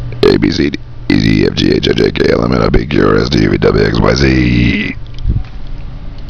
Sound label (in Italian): Alphabet, Rutti